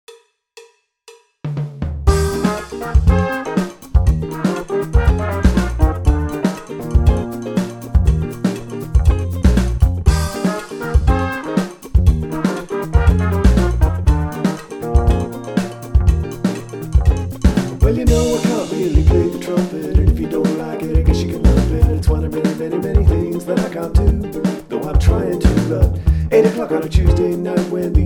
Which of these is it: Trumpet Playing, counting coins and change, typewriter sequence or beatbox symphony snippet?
Trumpet Playing